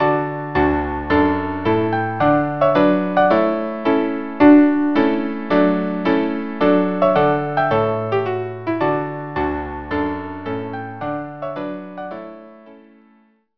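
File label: trumpet tune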